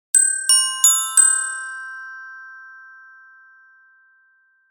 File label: chime